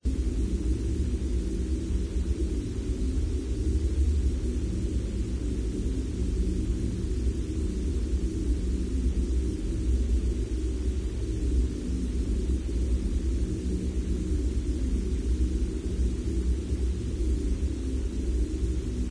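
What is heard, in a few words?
ambience space; Source Engine; deathrun starwars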